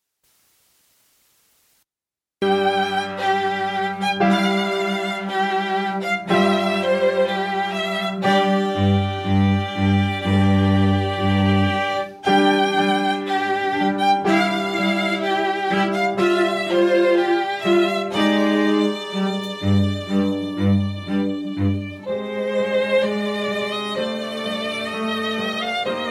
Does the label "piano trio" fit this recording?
Yes